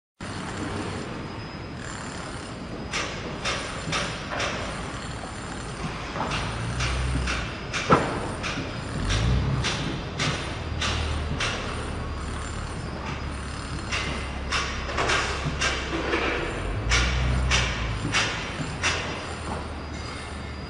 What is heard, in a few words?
building sfx